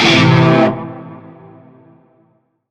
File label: Shawzin Pent Maj Chord; Warframe; Narmer Shawzin; Chords